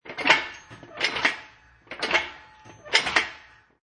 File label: Heavy Machinery, Heavy machinery being moved rhythmically. Metal squeaking and slight rattling; Machinery Sound Effects; Lynne Music